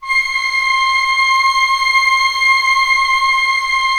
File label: ORC C6 PP; Sound On Sound; Peter Siedleczek's Orchestra